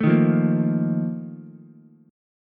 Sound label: Bb Major 7th Suspended 4th, Ukulele; strummed; JGuitar